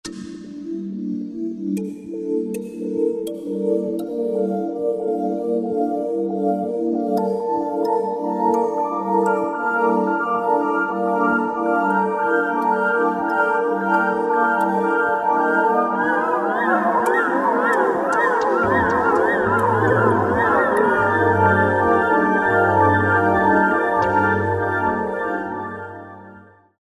ME Kali, Yamaha TG500

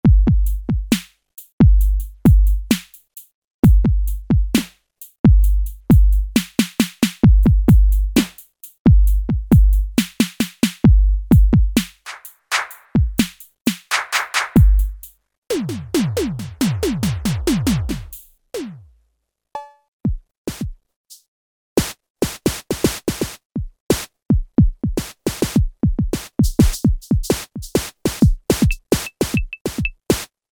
electronic drums; sampler; Guess the sampler game

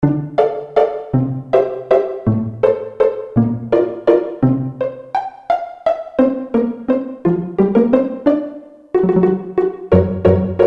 Pizzicato, Roland JD-990; Factory Patches

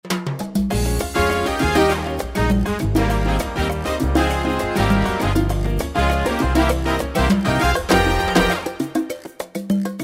Latin, Yamaha PSR-3000; Factory Demos

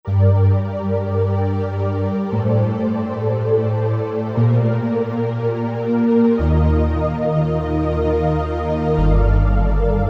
Deep Pad

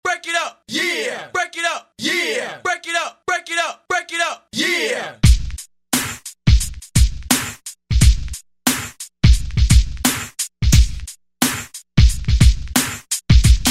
Hip Hop-Rap Kit, Korg TR-Rack

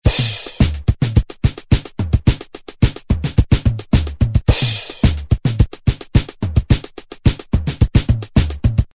Tribal House, Korg DDM-110

